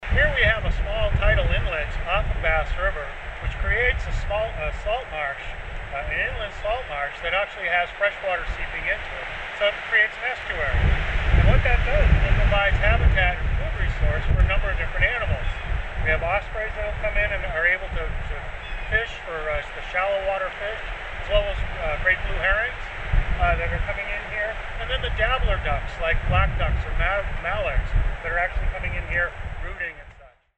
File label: Wind Before